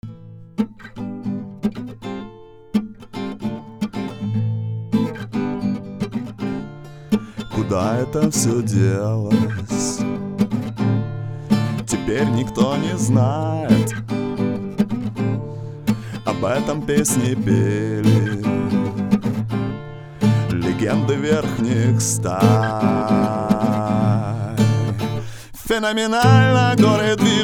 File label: acoustic